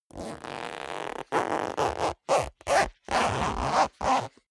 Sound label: zipper down jacket close unstable; objekte; reissverschluss